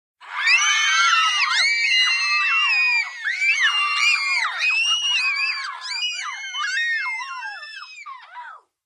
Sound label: 5 Kids Sus Scream Whoo, five children interior